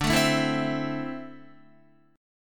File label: D 7th Suspended 2nd, down Guitar; 4F,4A,5C,5G,5C,6E; strummed; JGuitar